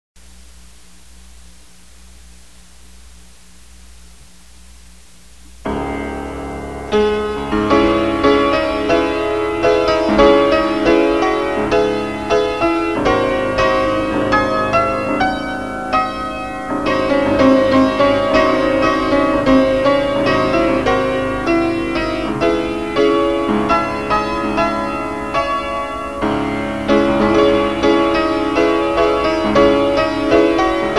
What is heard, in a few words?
PIANO